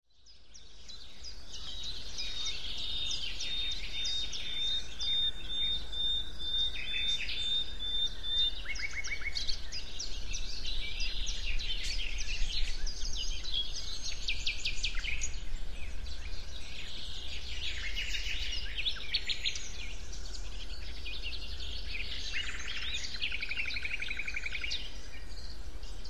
wiese

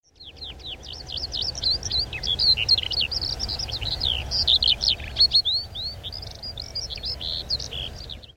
feldlerche; Alauda arvensis; Lerchen

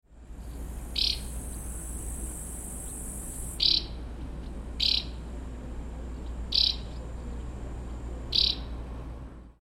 felsenschwalbe

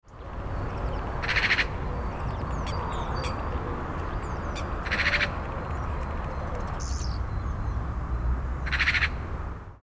elster

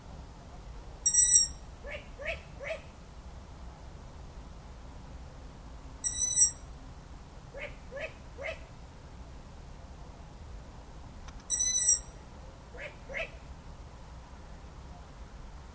waldohreule jung alt

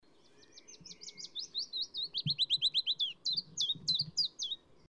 fitis zilp

